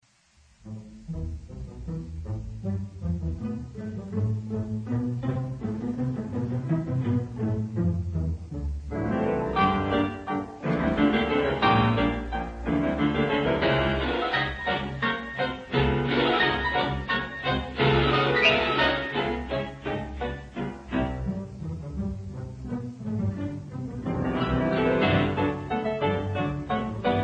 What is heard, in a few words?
Piano Concerto in C Major, Allegro ma non troppo; Prokofiev; Piano Concerto No. 3